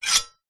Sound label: warfare sword medieval heavy draw scabbard